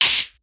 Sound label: Shaker, Dubstep One-Shot Drums